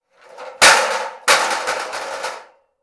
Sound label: impact metal ladder fall; sound effects one